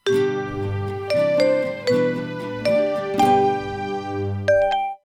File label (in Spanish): guit marimb